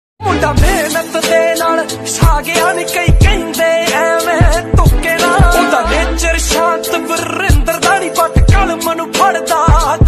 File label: Punjabi Beats Ringtone